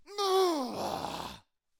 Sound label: Death Bringer Death Vocals